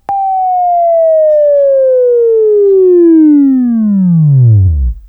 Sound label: WAV Right, Sweeping; Sweeping Training program